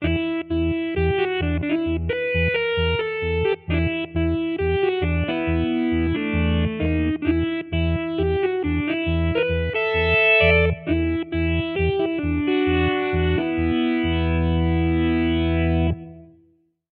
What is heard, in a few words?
Iron B Organ